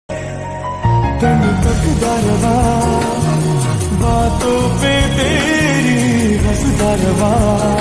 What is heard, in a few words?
Happy birthday jaan